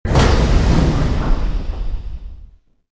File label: large plat stop, movers platforms